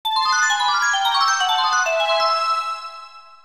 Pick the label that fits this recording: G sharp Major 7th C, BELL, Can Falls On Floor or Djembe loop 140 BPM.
BELL